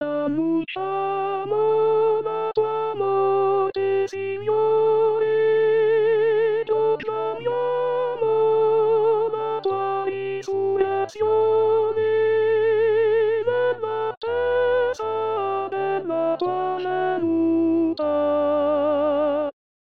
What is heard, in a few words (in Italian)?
Tenori